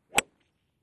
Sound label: Golf Ball Hit W Iron